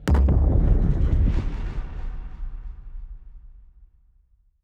ship move; beholder; Stellaris; paragon; ships

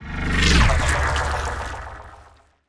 ant ship harbinger move; biogenesis

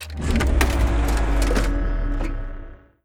slot machine; Stellaris; megacorp

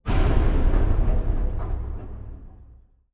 distance railgun hit, weapons; Stellaris

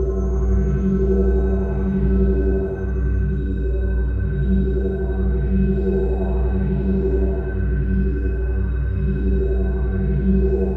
synthqueen ship small ship idle; Stellaris; machineage

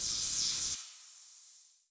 mega shipyard electricity amb

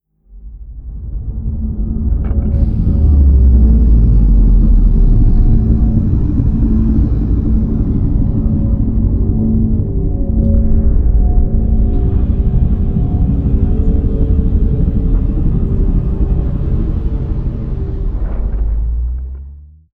avian colossus opening; apocalypse

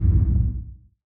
distance space amoeba hit; weapons; Stellaris

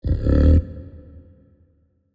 kaiju adult pre chomp; biogenesis

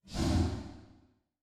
space dragon pulse; Stellaris; guardians

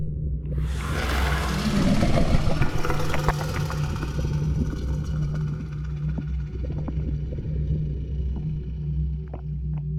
aqudragon reanimated idle; Stellaris

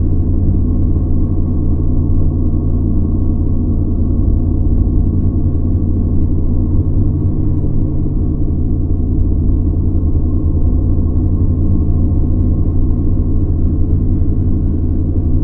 amb station hum; caravaneer; Stellaris; megacorp

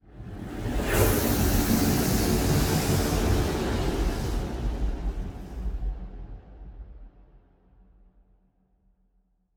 ship juggernaut move; Stellaris; machineage; ships

